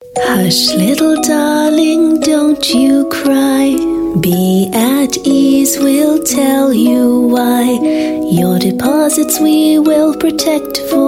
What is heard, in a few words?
Asian English Singing